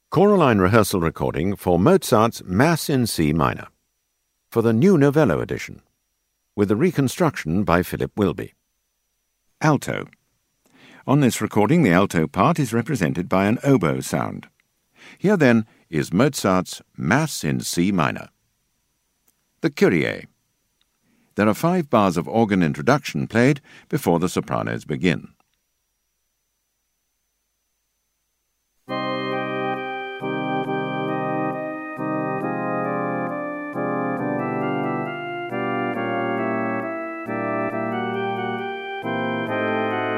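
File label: Alto; Mozart Mass C min(Nov); ChoraLine - Rehearsal CD & EasyPlay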